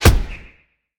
hit arcane; smite; god merlin

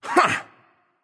nv grunt lift; cernunnos

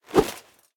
minion swing; smite; npc; roman archer

